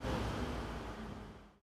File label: engines; World of Tanks